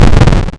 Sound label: explode